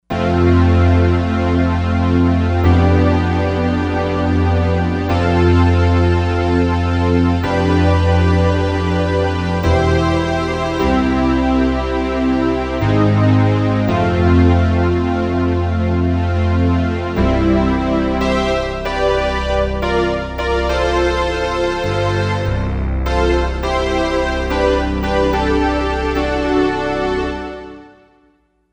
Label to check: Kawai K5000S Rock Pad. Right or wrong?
Right